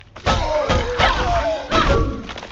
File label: attack; kena bridge of spirits